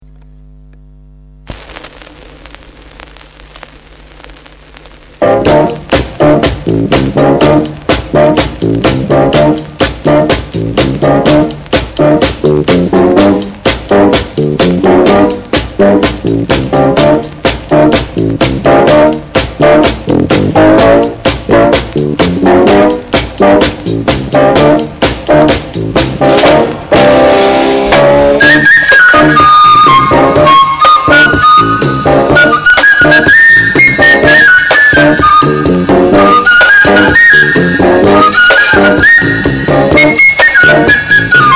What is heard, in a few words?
Fife Piper